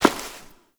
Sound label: Step Ice; Warframe; Koumei